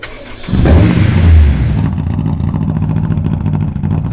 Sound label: startup, Kart, Engine; rTec Engine; AOS RF1 Kart Demo